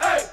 Chant

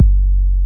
KCK

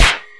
Clap

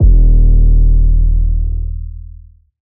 808, Eb

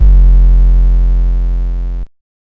808 Dist Bass; 808s

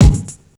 KIK

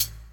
hihat, Closed Hats

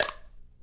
clap snare

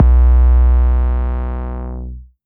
808's

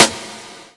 SNARE

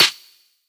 SNARE

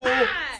Chant

